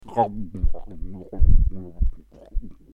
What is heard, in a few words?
crumhumphhumhum